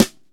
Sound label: clean snare drum sound a key, Royality free steel snare drum tuned to the A note; snare-drum-samples